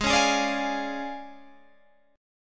D Minor Major 7th Flat 5th, G sharp

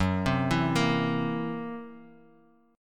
Gb 7th Flat 5th Gb down; arpeggiated; JGuitar

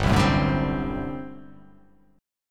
G Major 7th Suspended 2nd Suspended 4th G down

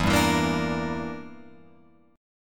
E Minor 7th Flat 5th, Guitar, Open F; Em7b5 strummed; 4,2,4,5,5,5

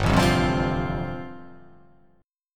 G 7th Suspended 2nd Suspended 4th

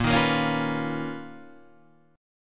B Major Sharp 11th A sharp

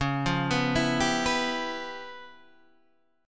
C sharp 7th Sharp 9th Flat 5th, chord; C#7#9b5 Chord {9 8 9 9 8 7} arpeggiated

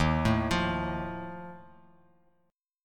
E flat Suspended 2nd Flat 5th, Bass Standard; arpeggiated; chord; x,6,7,10; JGuitar

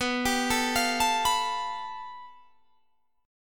A 7th Suspended 2nd Sharp 5th

